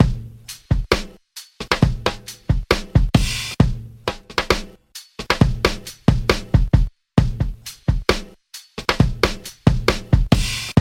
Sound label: funky programs, drum loops